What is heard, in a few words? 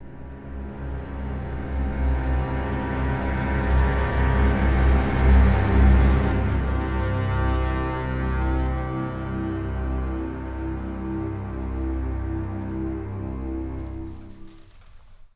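sting, string swell; transformers war for cybertron gamerip; ENTER CORE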